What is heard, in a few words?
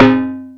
TIMBALE; Back In Time Records - Big Bang